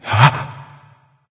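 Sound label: nonverbal breath; smite; voicepack; ullr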